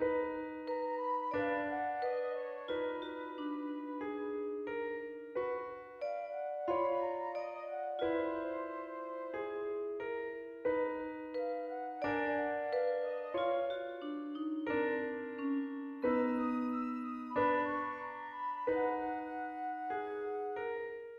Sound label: Piano